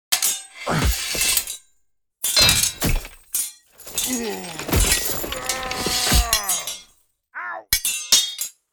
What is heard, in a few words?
Knight duel sword clashes hits and battle cries